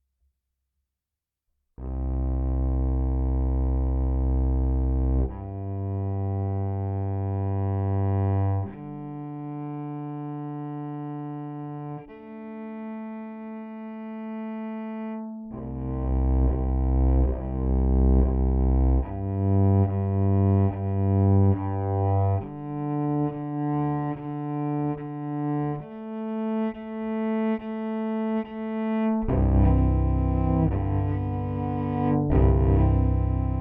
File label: pickup direct